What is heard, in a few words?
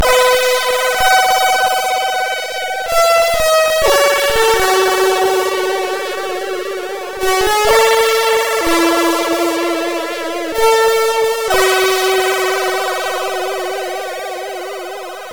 BGM, MOS6581R3; HVSC